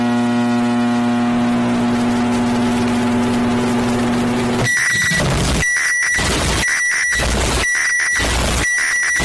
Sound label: extremely loud microwave exploding